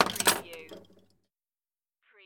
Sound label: Pinball sound, ball land roll and touch metal objects